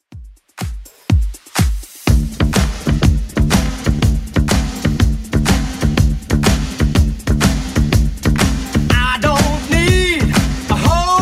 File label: 70s Club Edit 123 bpm